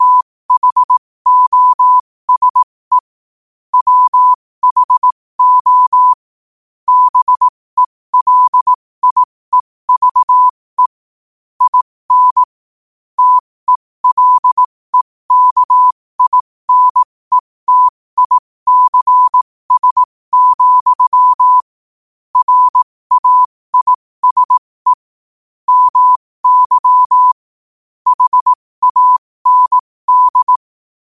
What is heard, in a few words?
5 wpm, morse